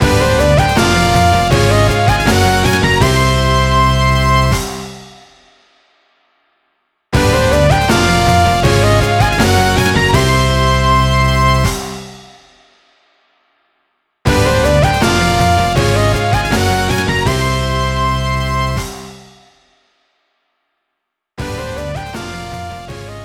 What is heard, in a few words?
Match Won; Sega Superstars Tennis